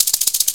CABASA, FAIRLIGHT CMI IIX, PERCUSN; PERCUSN1

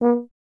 tuba; Miroslav Vitous - Solo Instruments VOL-2

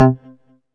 BASS, Synth Bass

